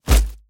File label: slash